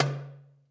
DARBUKA, AFRICAN DARBUKA

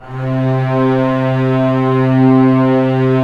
orchestral strings f slow; Roland LCDP13

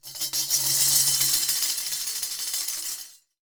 DAF L R SH-L, DARABUKA; WorldSounds

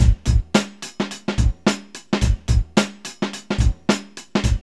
HI TUNE 108, Funky Elements loops; Zero-G

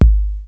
KICK, DRUMS; Kid Nepro; Elite

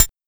HiHat Closed

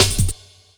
Rev Snare, Hot Pantz Rev Snares; Zero-G Jungle Warfare 1, 2 & 3; Percussion Hits; Drum Loop Hits

